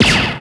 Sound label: ROCK